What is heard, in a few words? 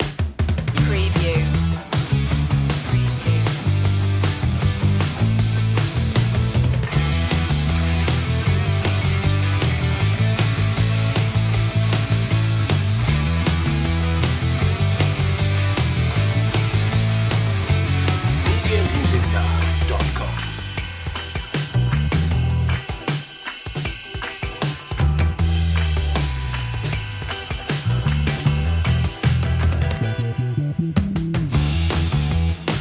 high energy